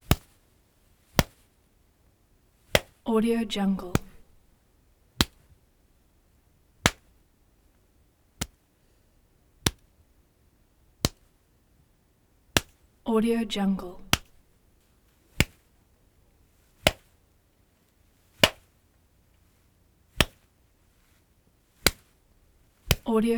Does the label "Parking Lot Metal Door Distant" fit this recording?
No